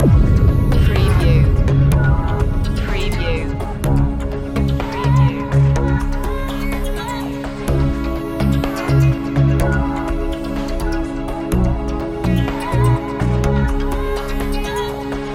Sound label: dancefloor loop